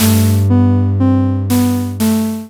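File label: music with Beats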